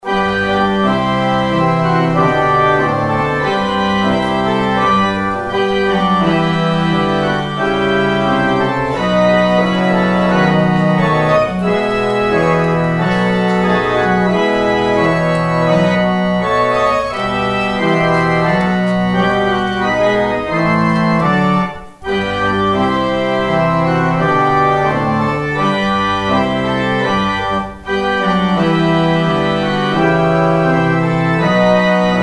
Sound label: organ outro; exit music